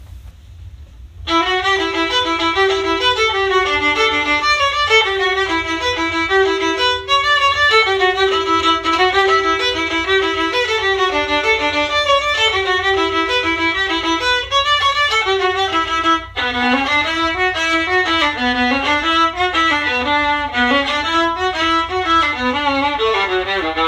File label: Swallowtail Viola; christmas concert practice clips